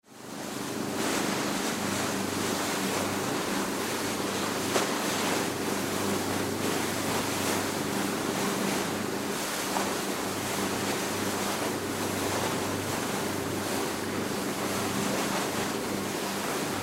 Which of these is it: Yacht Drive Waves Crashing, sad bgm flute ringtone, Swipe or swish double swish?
Yacht Drive Waves Crashing